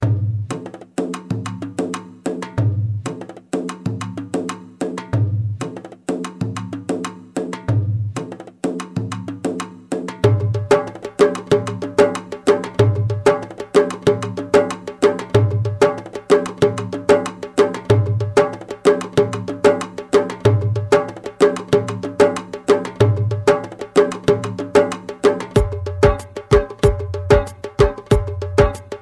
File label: darbuka